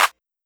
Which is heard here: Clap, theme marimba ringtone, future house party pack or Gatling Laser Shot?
Clap